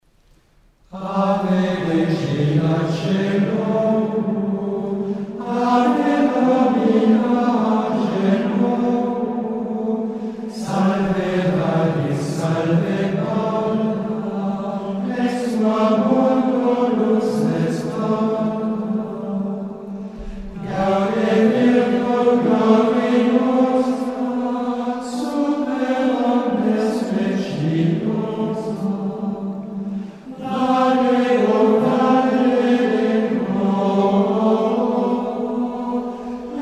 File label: Antiphon After Compline; Ave Regina Caelorum